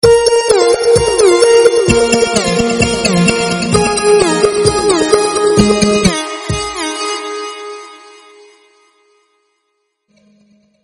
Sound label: instrumental, ringtone; krishna hare